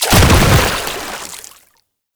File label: Bodyfall Water; Enemies; Warframe; Proto Infested